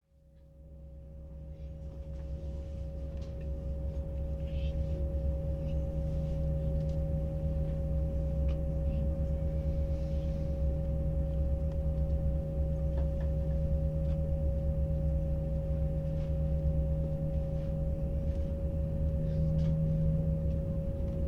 Aircraft airbus 319 or 320 interior take off and flight; Airplane Sound Effects